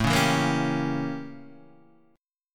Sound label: A 7th Flat 9th Guitar Standard